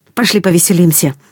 attack, human woman fight; attack one; Stalker Anomaly

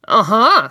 laughter, woman; Stalker Anomaly; human

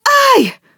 hit, human woman fight; Stalker Anomaly

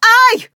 hit, human woman fight; Stalker Anomaly

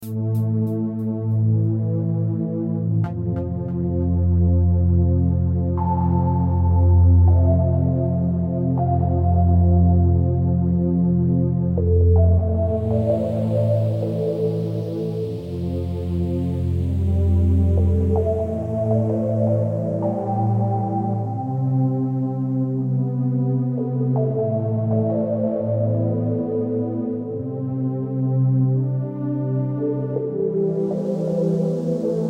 ambient; X-Bow Software; 2004 - Star Wolves

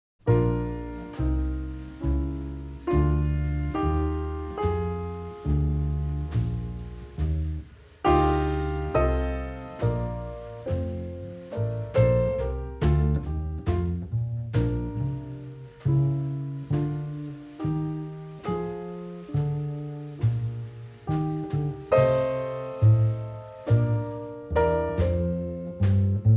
partita in B flat major minuetto

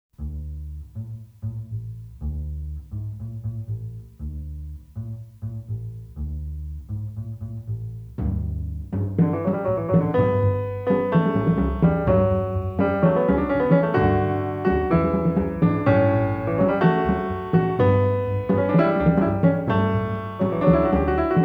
fugue in D major

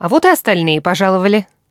attack many, human woman fight attack; Stalker Anomaly

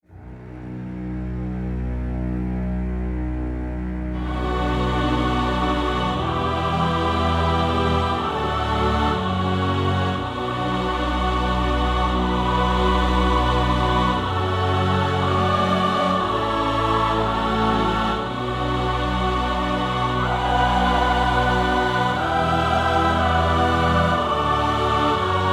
instrumental

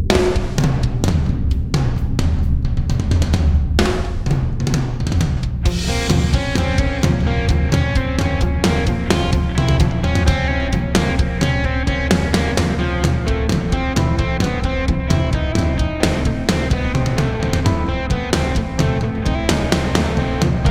Instrumental Version

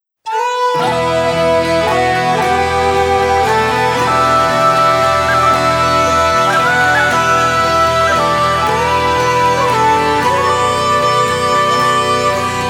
acoustic version

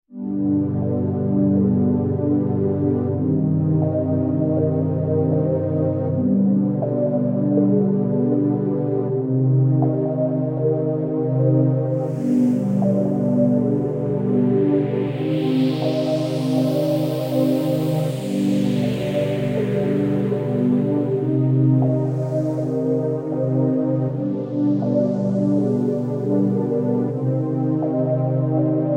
ambient; X-Bow Software; 2004 - Star Wolves

